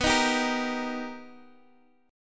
Eb Major B

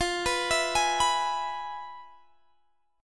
F Minor Major 7th Flat 5th Guitar Open G

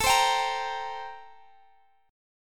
A-Minor 7th Flat 9th, chord

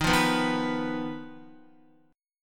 Eb Suspended 2nd Sharp 5th, Guitar; Ebsus2#5 strummed; JGuitar

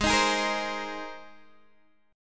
Ab Major Add 9th Ab Guitar DADGAD; Abadd9; strummed; 18,18,18,15,15,x

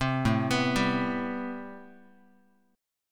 A Minor 9th Guitar; arpeggiated; JGuitar

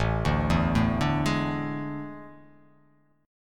A Major 7th Suspended 4th

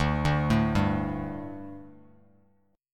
D Suspended 4th D, Bass Standard; arpeggiated

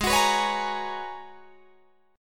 G sharp Minor 11th G sharp Guitar Drop D, chord

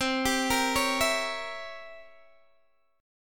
C 7th Flat 9th C down Guitar Open D

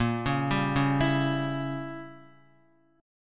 Bb Diminished 7th Bb Guitar; arpeggiated; JGuitar

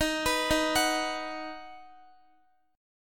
Eb Minor Sharp 5th Guitar; Ebm#5 arpeggiated

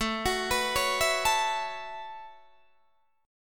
A 6th Add 9th A down Guitar Open D

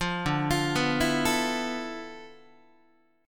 D Minor 6th Add 9th down Guitar; Dm6add9 arpeggiated; JGuitar

